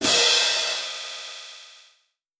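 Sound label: Hand cymbal, Metallic Percussion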